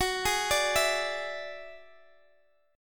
Gb 7th Suspended 2nd Sharp 5th Gb, Guitar Standard; arpeggiated